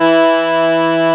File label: BANDONEON